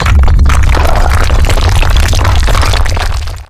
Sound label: rock fall